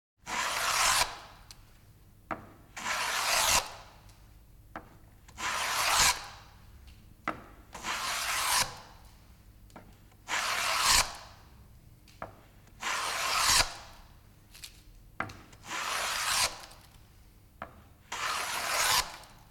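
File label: hand plane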